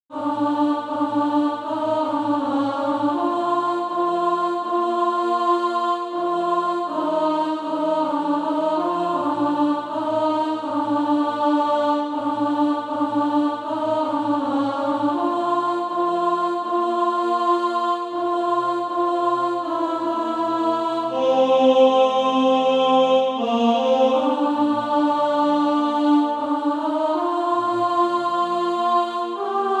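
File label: alto, Practise Music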